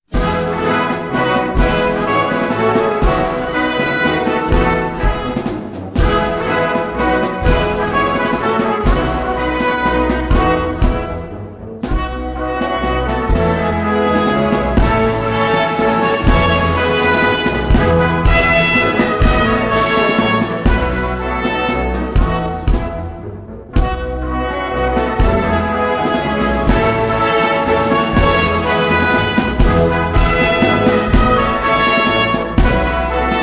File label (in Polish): hymn polski